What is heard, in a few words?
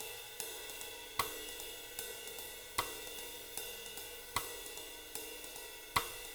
SWING, Living Drums; Sampleheads; Peter Erskine Living Drums